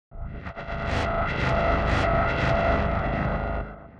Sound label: Growler